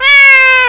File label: miaow